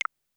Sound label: scroll